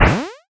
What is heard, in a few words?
springboard